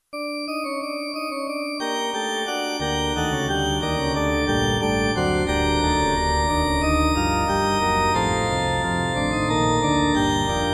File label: gothic organ theme